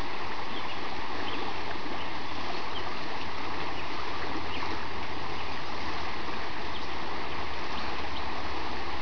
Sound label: stream